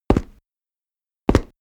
step stone walk

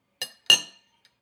cutlery on plate clatter and scrape, gastronomie; soundpool

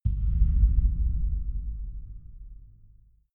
far outer space shadow, hits impacts

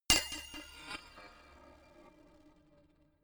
notification glockenspiel crazy single high

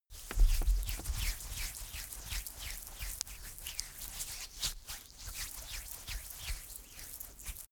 rubbing hands; comedy-cartoon; koerpergeraeusche

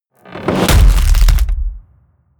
hit wood, hits impacts; whooshes rises

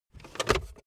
car backseat table pull up fast hard

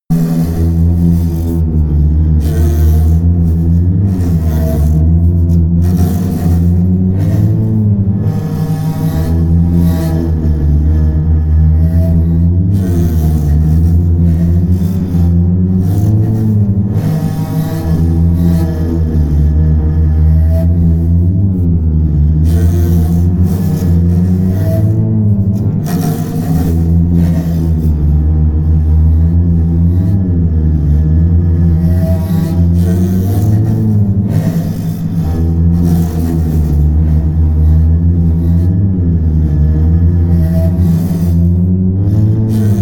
drone erratic didgeridoo low

